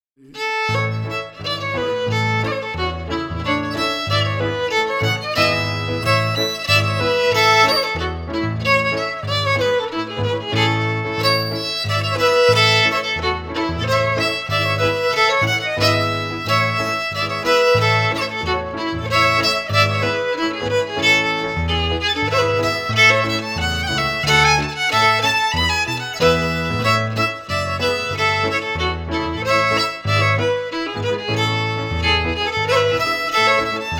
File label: FIDDLE PIANO; Jink & Diddle Book o' Tunes 2022